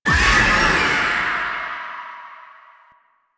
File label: Apparition Spectral Cast; Warframe; Warframes; Dagath